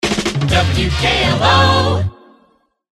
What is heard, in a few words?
Shotgun Jingle; WKLO; TM